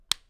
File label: Flashlight Switch; Opsive; Ultimate Character Controller; Items